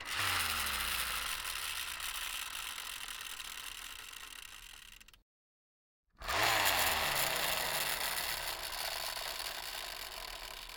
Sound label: toy cars; devices accessories utilities; NS Studios - ultra sound collection